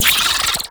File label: Oraxia Brood Spider Vocal; Warframe